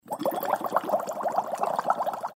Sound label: sub Bubbles; jetpackLearningPlatform; bubble-trouble